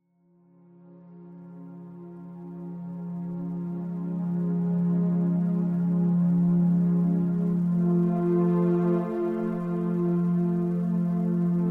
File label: 174Hz; Sulafeggia